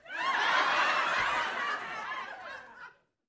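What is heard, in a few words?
Crowd 50 Single Hearty, small interior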